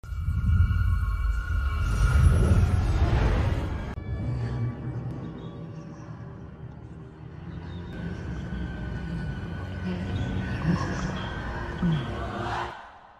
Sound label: neck snap; scarlet witch